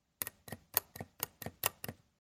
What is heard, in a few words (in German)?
Seifenspender